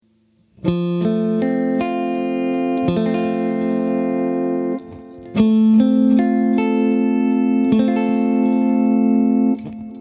F MAJOR TRIAD INVERSIONS

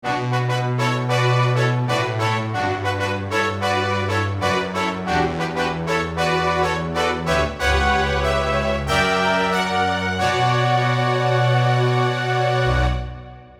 Strings n Brass; Halion

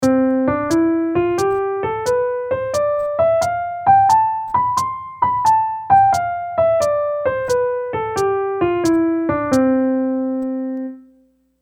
Accented offbeats

